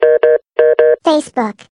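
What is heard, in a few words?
notification ringtone; Facebook notif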